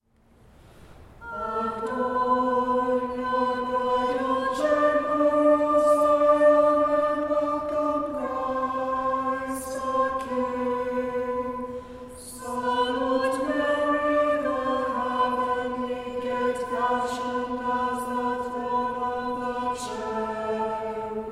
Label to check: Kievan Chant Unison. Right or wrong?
Right